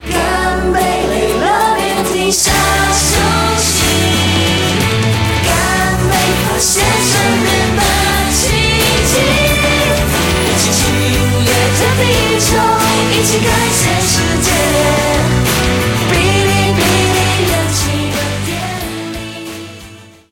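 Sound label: background music crossfade; Invaxion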